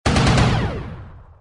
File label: Gun Blast